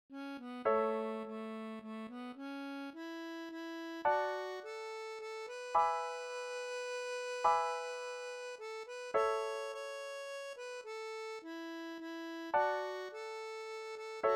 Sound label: lead